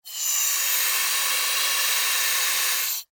Pneumatic Release, Scifi; Air, Valve, Science, Fiction; Spacecraft; BlastWave

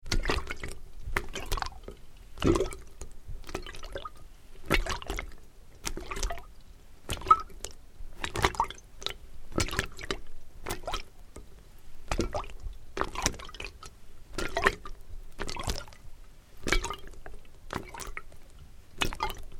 Water Hot Water Bottle Sloshes Lite; Yard Tools; BigRoomSound

